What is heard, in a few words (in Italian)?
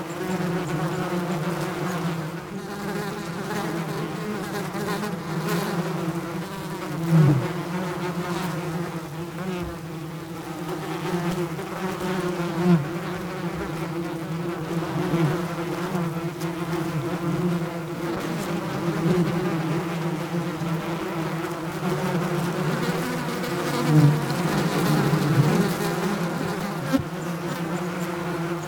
Ronzio insetti loop; Inquisitor 3